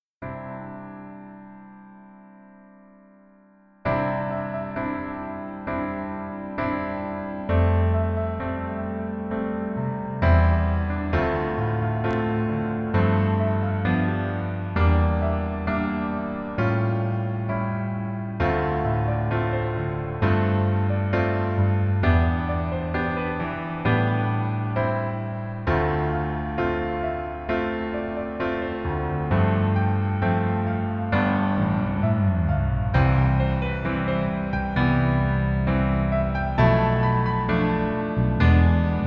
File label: Piano